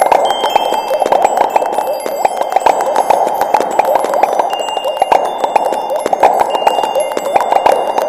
rainblower loop; tf

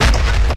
giant heavy step; source engine; tf; mvm; video games

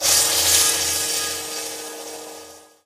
lightbridge elec, footsteps

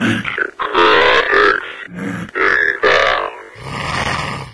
zombine alert; source engine; episodic

